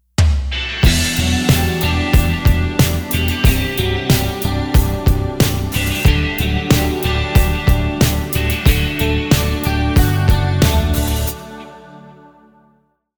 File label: guitar pattern